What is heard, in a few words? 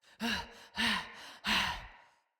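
Cry Heavy Run Female; Battle Barks; Envoy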